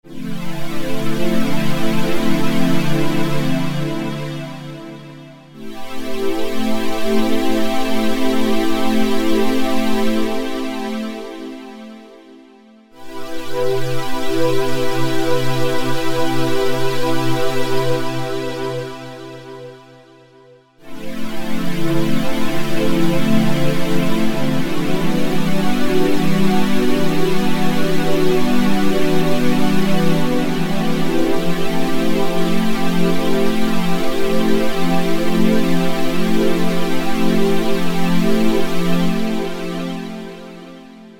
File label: SYNTH PADS Silicon Strings; Apple GarageBand 2